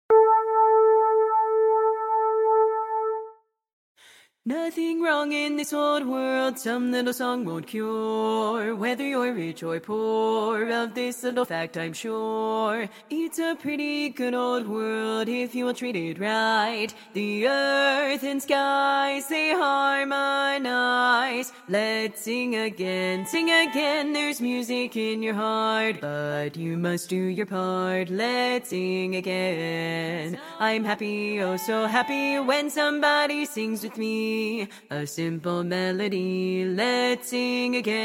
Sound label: bass, chorus